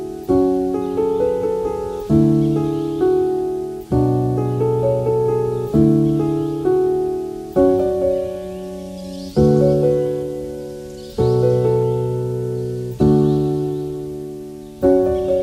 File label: Oppo K10 5G Ringtone; Oppo K10 5G (China)